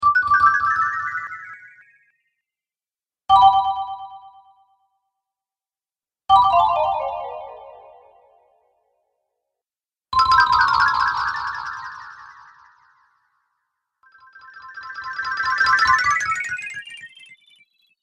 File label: Magical Cartoon Spell Collection